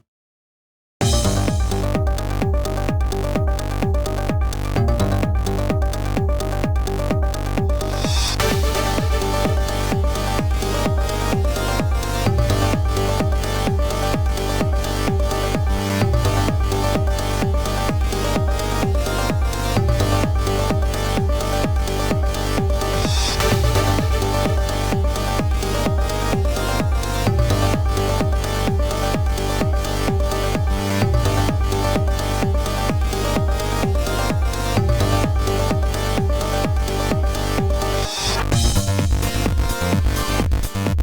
Duel Music; YuGiOh Over the Nexus

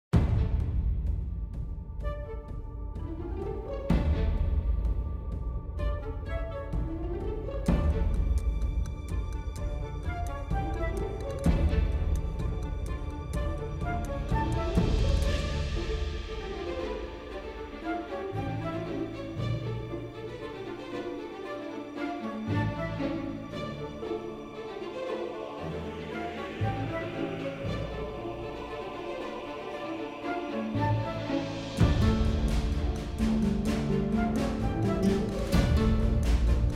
Epic Orchestral Cover

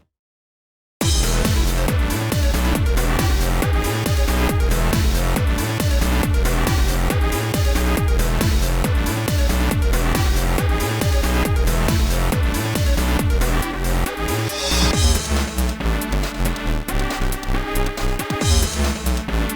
Duel Music - Vicious Attack; YuGiOh Over the Nexus

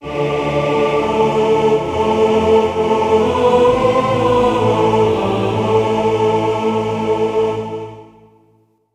Chozo Seal Unlocked Jingle; Metroid Samus Returns Original Soundtrack

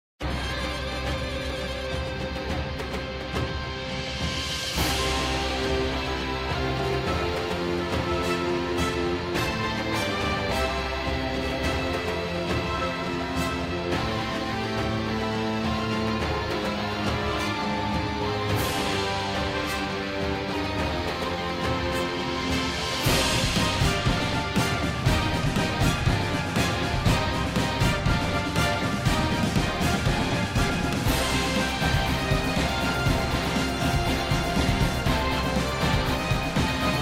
Epic Orchestral Cover

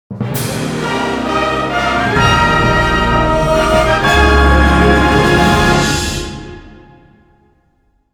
Jingle

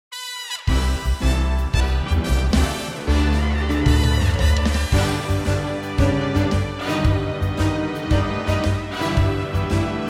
Instrumental Version, Big Band Jazz Cover

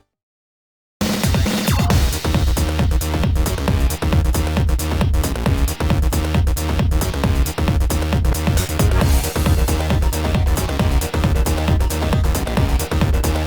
Duel Music; YuGiOh Over the Nexus

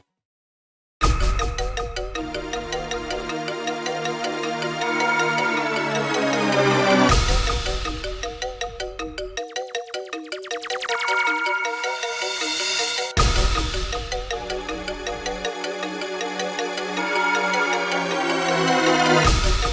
BGM Music; YuGiOh Over the Nexus

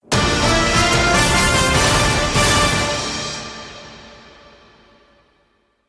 Fanfare

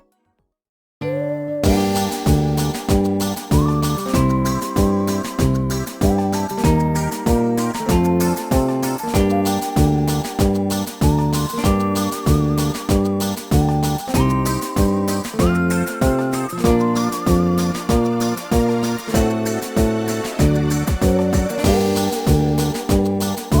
Map Music; Crash Town; YuGiOh Over the Nexus

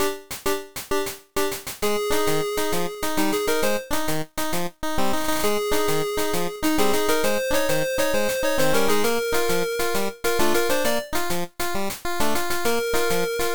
Radio March GB Sounds; Pokémon HeartGold & SoulSilver

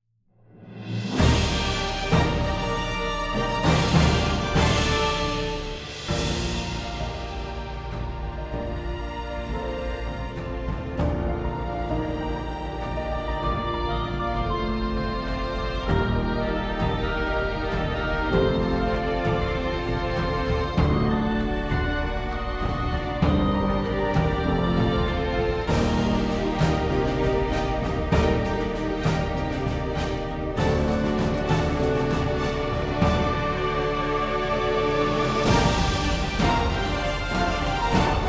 Epic Orchestral Cover

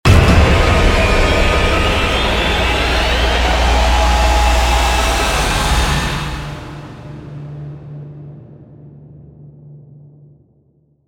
Jingle

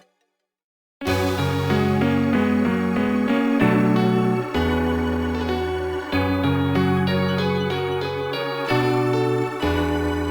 BGM Music; YuGiOh Over the Nexus

